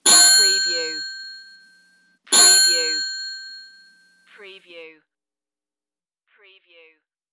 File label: Antique Bicycle Bell